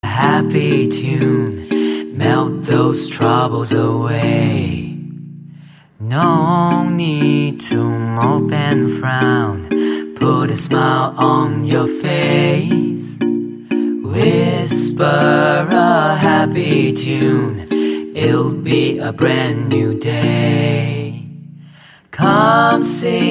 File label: singaporean new a happy tune